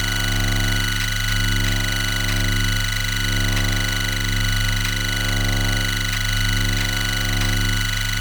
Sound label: Computer Terminal Loop; Ambience; Props; Warframe; TheNewWar; Zariman